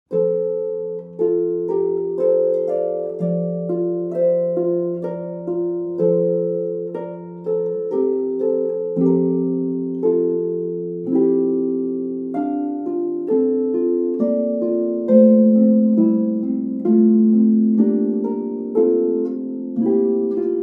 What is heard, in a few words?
Minuet From Orpheus (Pedal Harp); british isle